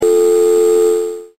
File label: dial tone